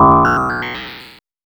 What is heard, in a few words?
POLYMOD; AMG - Vince Clarke Lucky Bastard